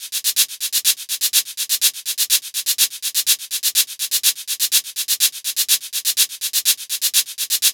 Melodic House Kit 124bpm Shakers; EDM Drums; Drum Kits; Stems; Ghosthack; Ultimate EDM Drums

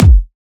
Kick, Dubstep; Dark Matter

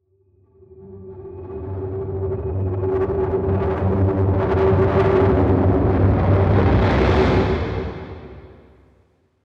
Riser

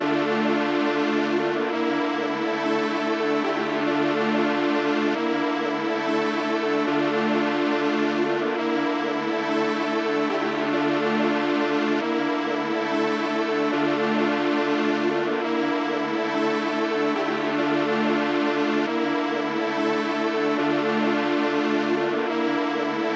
140 BPM D Min Strings, Drill; Loop Stems; Cymatics; 2022 Melody Collection; Empty The Clip

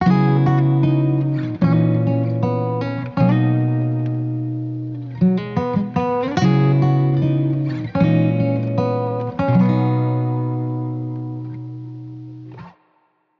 Acoustic Guitar Loop Wet 75 BPM B Maj; Melodics; Cymatics; Eternity Sample Pack; Guitars - Acoustic

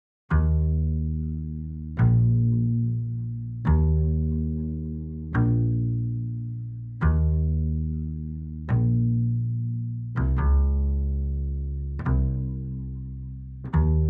143 BPM A# Min Bass, Hip Hop; Melody Loops; Loop Stems; Cymatics; Americano

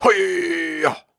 Grunts and Chants, Viking Vocal

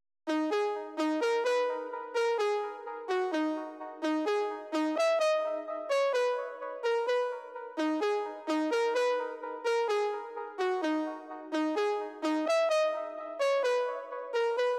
128 BPM G# Min Lead; House; Loop Stems; Cymatics; Breakdown Loops; Jungle

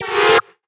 Retro FX; Cymatics; Video Game Sample Pack Vol 1

